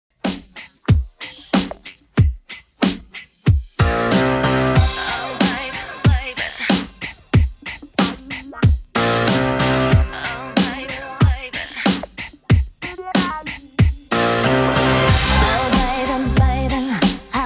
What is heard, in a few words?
90s Redrum, 93bpm